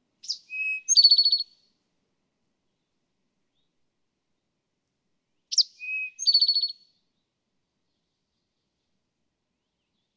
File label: Eastern Towhee